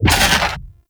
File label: Fairy Dust Impact